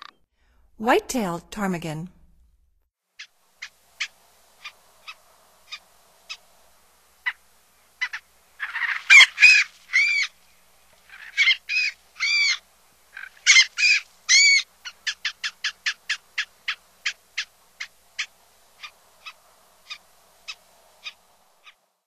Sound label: White tailed Ptarmigan; North America; Stokes